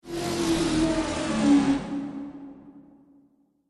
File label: Robot Landing, sci-fi robot sound effect; Sci-Fi Robot Sfx; Andrew Fleming